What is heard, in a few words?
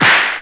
whip crak